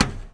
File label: metal step; Golden Crayon Sounds